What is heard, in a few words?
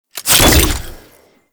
Grenade Throw; Warframe; Veilbreaker; Kahl Abilities